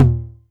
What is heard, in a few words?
tom mid, Novation Drumstation; Kit00